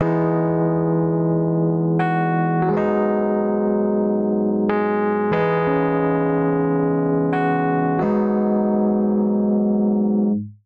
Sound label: Electric Tubes Ab Minor 90 bpm; MIDI Clips; Tonal; Accompaniment; Ableton; Factory Packs; Chop and Swing